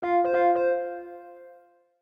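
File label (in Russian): сигнал говорящий о том что пришло системное сообщение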